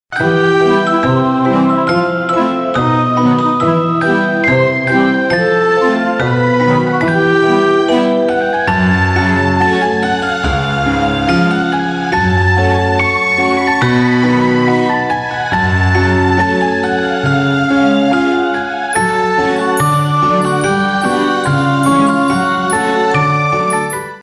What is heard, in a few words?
wintery waltz